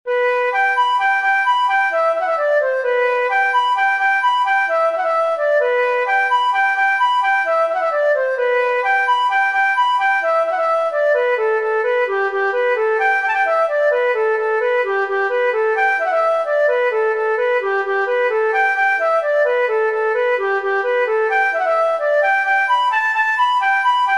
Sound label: march for flute oboe harp; macallistrum